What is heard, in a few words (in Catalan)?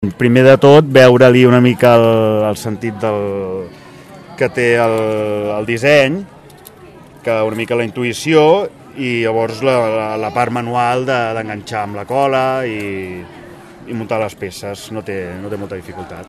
guitarres